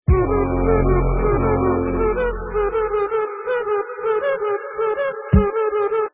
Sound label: slow; adafruit circuitpython bundle; macropad; macropad mp3